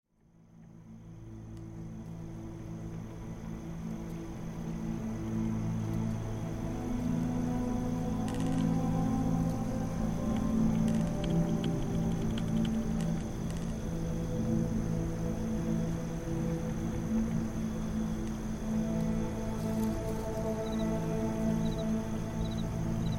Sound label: ambient fantasy music with sounds of forest at night